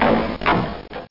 stairs; aworld

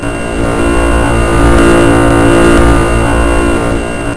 ELEC; Reflux

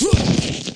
FALL; PC Gamer 4.5

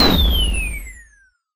fire drop; Geschicklichkeit; Bricks Of Egypt

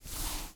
foley cloth short; Earth and Beyond; EA Games

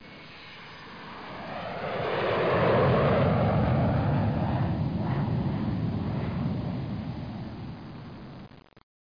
jet; hardware

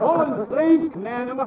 walla; DAoC

